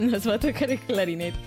clarinete

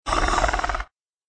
ronroneo leve

